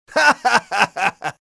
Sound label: laughing businessman